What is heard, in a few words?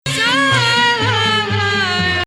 Nahawand